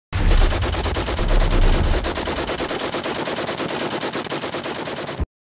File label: Ww1 Machine Gun